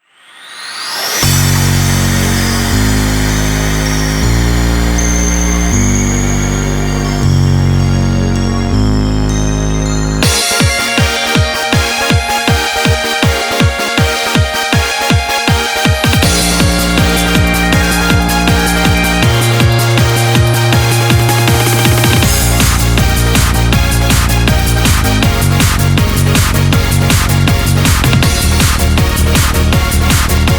instrumental

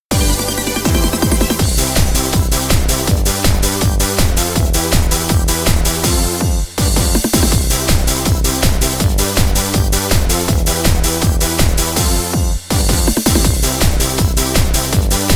off vocal

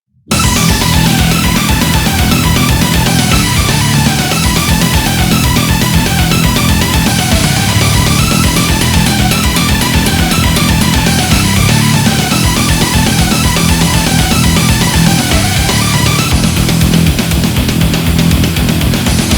Instrumental

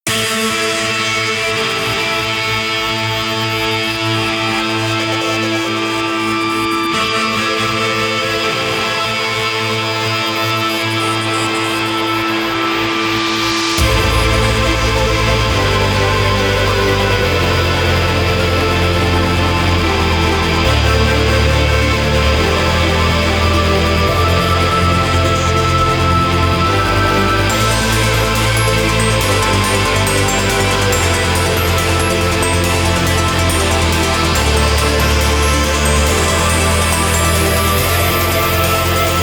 A bright future ahead, Instrumental

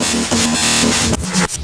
FILL, PsyTrance

